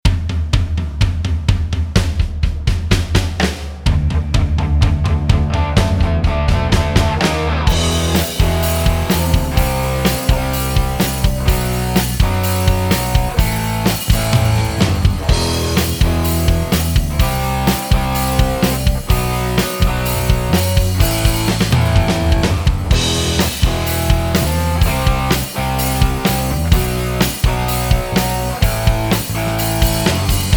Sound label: backing